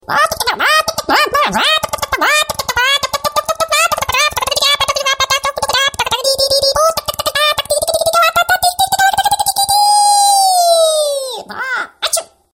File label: chicken clucking